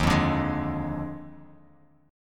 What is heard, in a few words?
Eb Major 7th Suspended 2nd Suspended 4th Eb Bass Standard; strummed; 11,8,12,13; EbM7sus2sus4